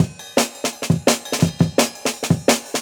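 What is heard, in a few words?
Jungle Breaks, 170 bpm; Crockery; Original Jungle Breaks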